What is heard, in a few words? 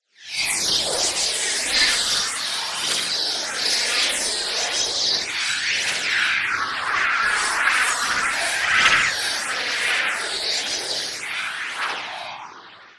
flying